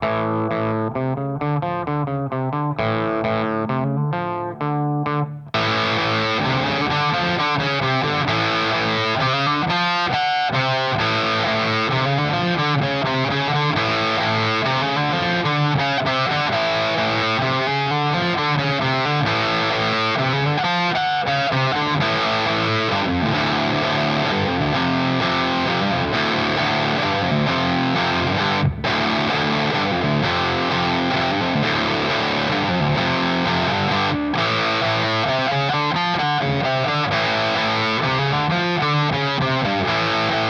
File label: Channel A Distortion